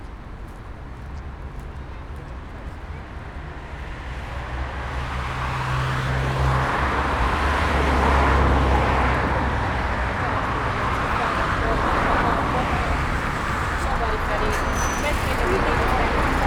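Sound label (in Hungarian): 105-ös békéstéri megálló kívülről, busz; Gyöngyösi út felé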